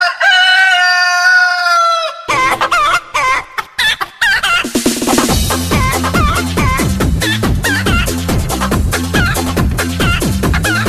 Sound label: crazy chicken, sonnerie